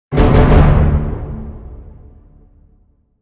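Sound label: Hit Orchestra Tutti Triple D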